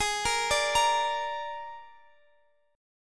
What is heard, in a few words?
Ab Suspended 2nd Flat 5th chord; arpeggiated; Absus2b5; x,x,18,15,15,18